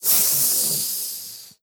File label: Vo Beast Stabbed Light; Legend of Keepers